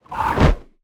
Otter death woosh

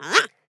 Otter attack

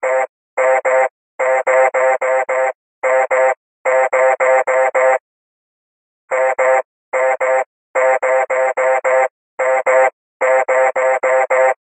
Carol Voice

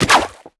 Background Otter attack

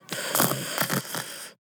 Otter sleep loop

